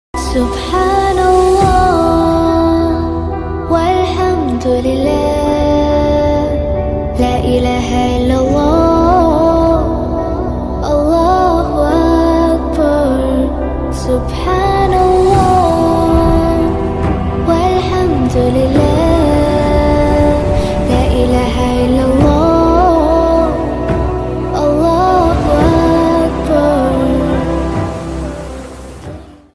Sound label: subhanallah walhamdulillah ringtone naat ringtone azaan ringtone